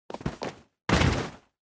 Motion Fall Stumble Short

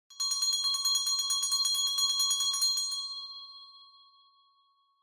Winning Bell Strong

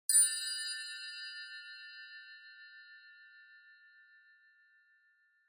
Cluster Chimes Gentle Short

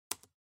PC-Keyboard (Enter-Mid)

